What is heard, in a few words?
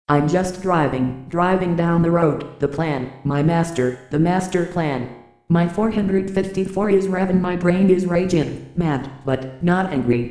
song Voice Synthesizer